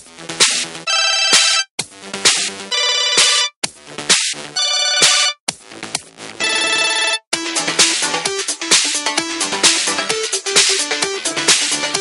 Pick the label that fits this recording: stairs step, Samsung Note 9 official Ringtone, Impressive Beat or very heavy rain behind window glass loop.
Samsung Note 9 official Ringtone